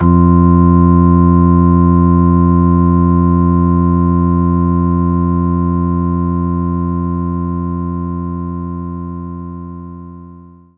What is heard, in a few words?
Hammond Organ